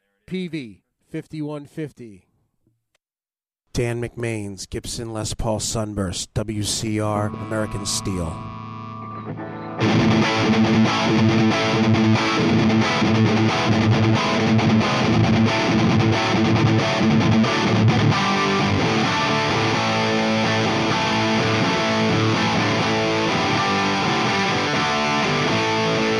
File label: peavey 5150 bridge